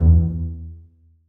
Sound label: basses piz d#2; Sonatina Symphonic Orchestra